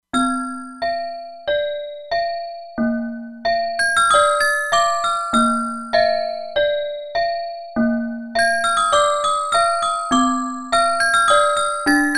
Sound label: Gamelan, E-mu Proteus-2; Factory Presets